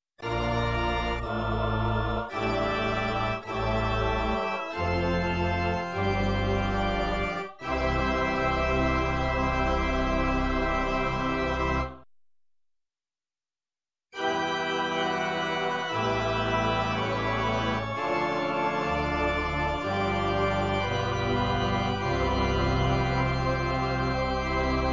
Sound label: Organ bridge With Choir, Music; Sound Ideas 2000 Series